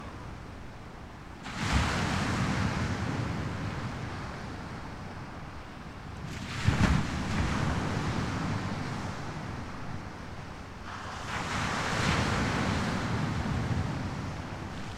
Breakwater Crushing Waves

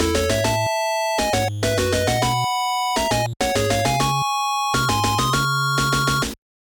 Stage Clear 8 Bit

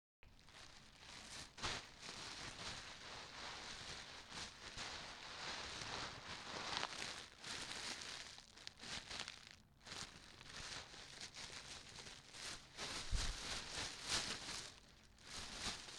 Plastic Bag

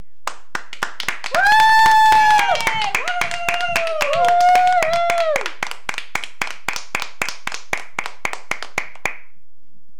Family Applause

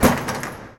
Football table

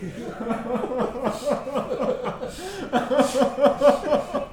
Man Laughing

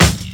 Hat

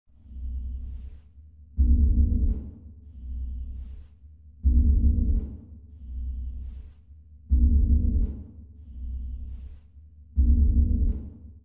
Alien Factory

